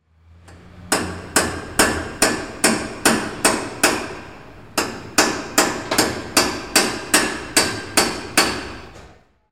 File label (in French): bruit marteau